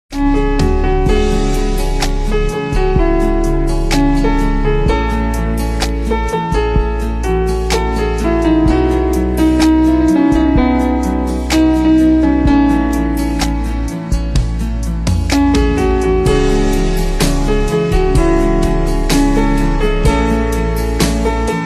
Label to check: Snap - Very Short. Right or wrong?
Wrong